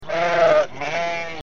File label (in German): Schaf; Tierstimmen